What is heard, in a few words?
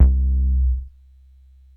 Bass Synths hits and octaves